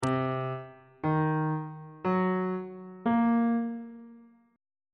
b flat minor 7th chord 2 is c half diminished 7th on bass clef